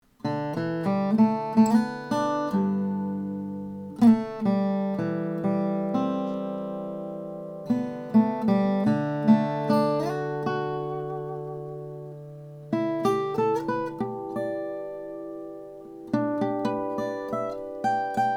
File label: collings 001a mahogany